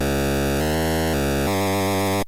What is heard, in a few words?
death fanfare; pitfall